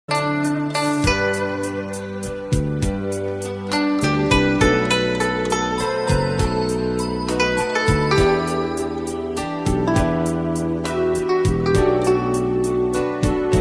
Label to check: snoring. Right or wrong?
Wrong